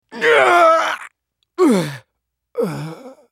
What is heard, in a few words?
dying; Swain; en US